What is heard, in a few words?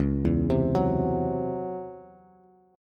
Db Minor Major 11th Db down Bass Bass Standard; arpeggiated